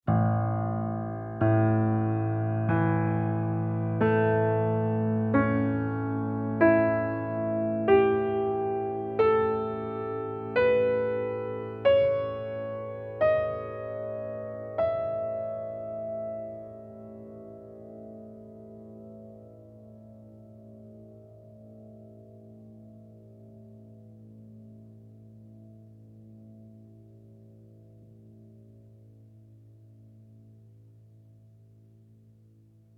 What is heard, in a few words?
Pno O tone